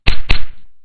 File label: punch; attack